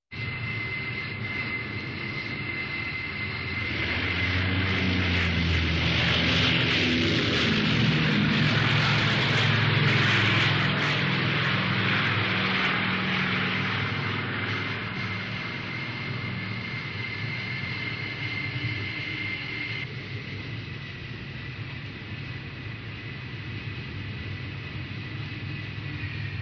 Posadka samoleta s podrulivaniem

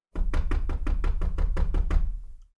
trebovatelno; Звук стука в дверь для сказки; Для детей, для детских постановок

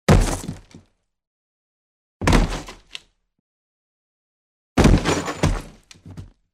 tri varianta padenija; Звуки падения человека

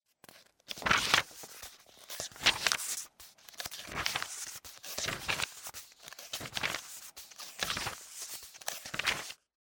shoroh perelistyvaemyh stranic silnyj

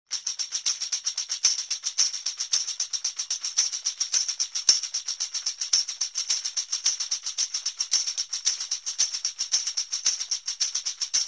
bystryj ritm

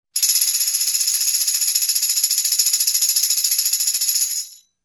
ochen bystro trjasut

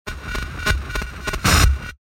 cifrovoj glitch

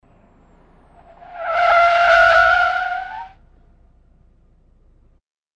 rezkij tormoz

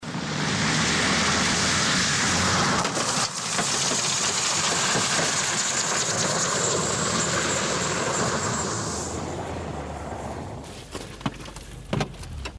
mashina pereezzhaet policejskie shipy na zasnezhennoj doroge i ostanavlivaetsja

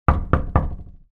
silno tri udara

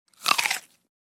korotkoe jabloko; Звуки откусывания

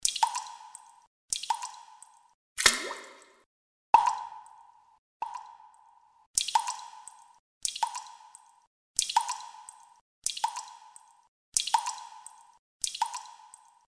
zvuki kapel voda

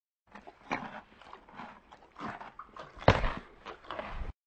loshad zhuet morkovku i bjet kopytom; лошадка есть морковку; Лошадь жует траву, сено, овес, морковку

